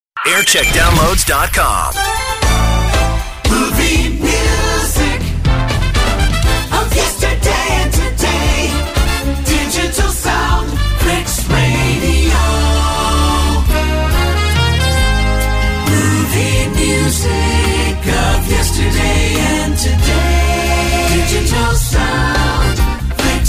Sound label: Jingle Montage; Flix Radio; JAM; 2000s; The Severn Shropshire